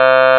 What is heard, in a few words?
android, Digital Synths; Ableton Foundation FreePacks